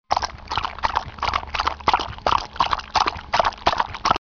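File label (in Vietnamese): tiếng con ngựa bước đi qua vũng nước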